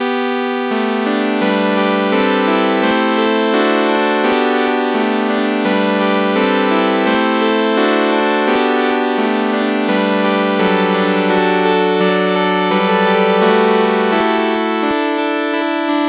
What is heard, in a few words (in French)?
clavecin